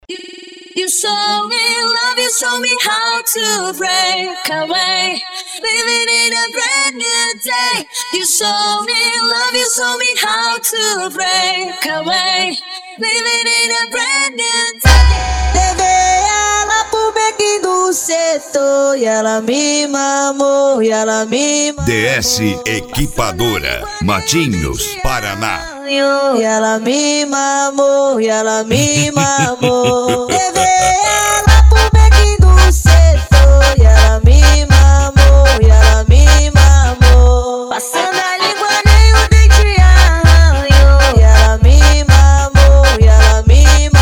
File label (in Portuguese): funk grave